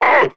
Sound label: die